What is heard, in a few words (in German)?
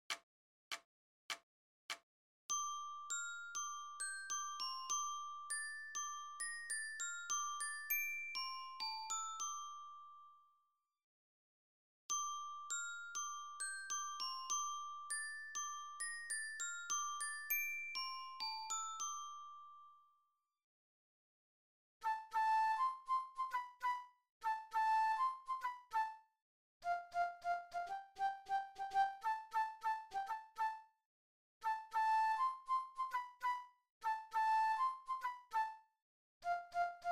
Drumshow, Melodie; Drumshow JK 2014